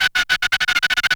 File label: Turntables